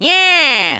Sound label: happy; tf; waluigi